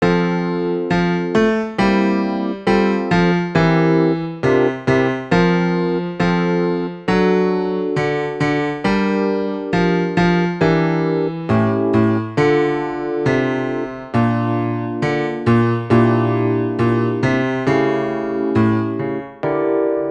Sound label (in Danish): bas; Du som har taendt